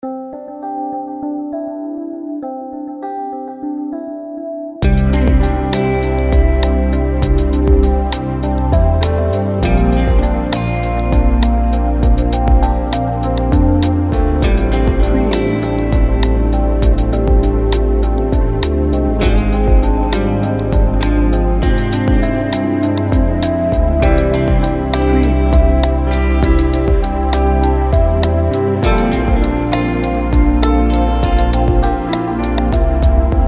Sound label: warm and atmospheric